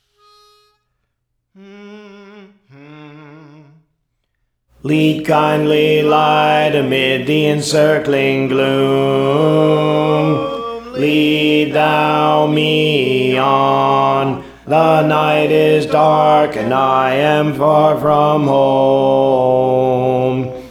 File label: Alto; Praise For The Lord Training; Lead Kindly Light